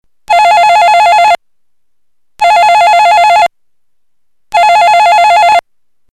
telefon mono

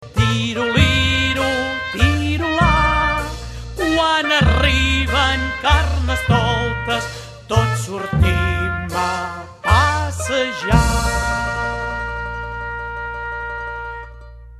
carnestoltes

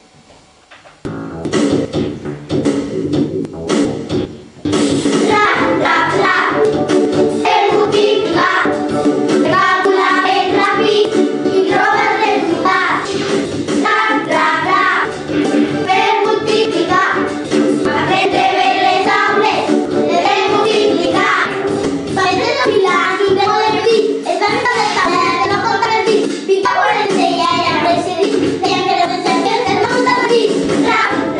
rap taules

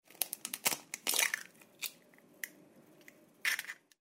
trenca ou

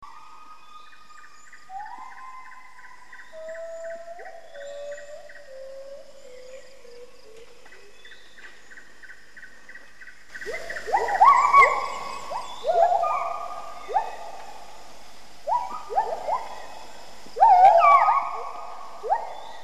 selva

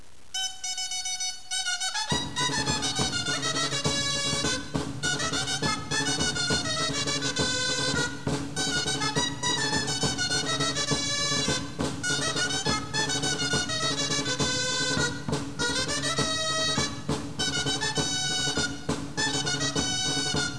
jota musica

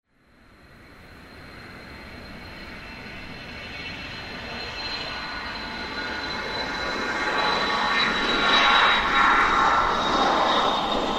enlairament

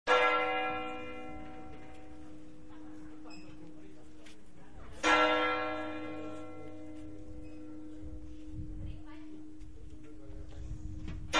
toc d'agonia, campanars

